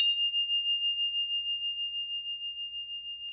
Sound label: Crotales, Orchestral Perc